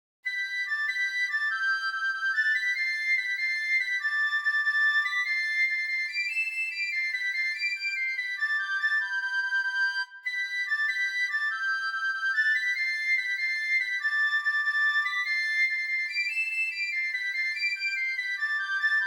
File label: french quick march